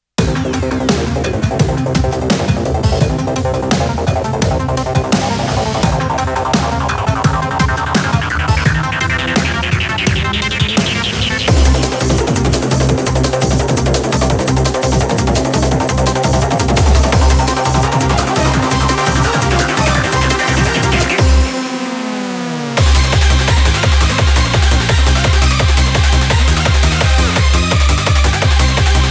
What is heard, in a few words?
Instrumental